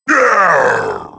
bowser; mario kart wii

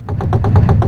Move Cannon; New Super Mario Bros. Wii

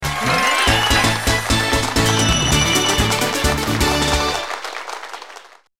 battle win; Paper Mario 2 The Thousand Year Door

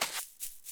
Land Tree

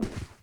Hot Air Balloon Land; New Super Mario Bros Wii

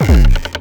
Raft Ground Pound; New Super Mario Bros. Wii

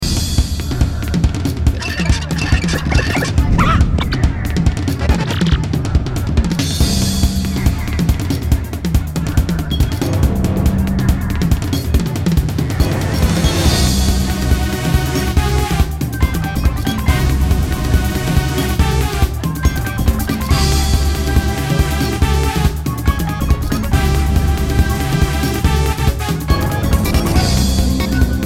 boss battle; Paper Mario 2 The Thousand Year Door

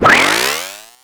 Propeller Suit Fly; New Super Mario Bros. Wii

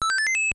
sound effects spade match; super mario bros 3 nes

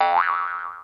Spring

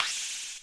Slide Sand

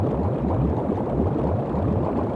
Bubbles Ambience; New Super Mario Bros. Wii

